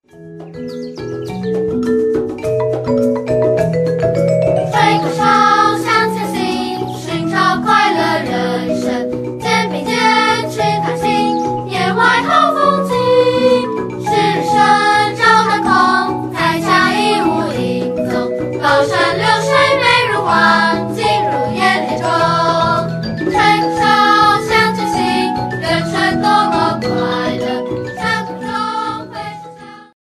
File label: happy lifesinging